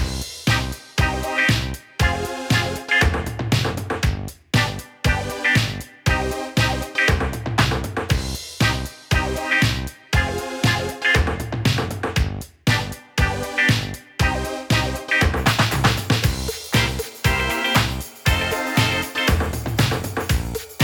Techno Monster Dance Party, funny

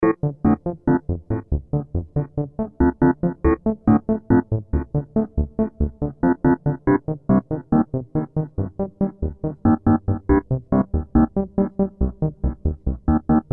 8-Bit Indigestion

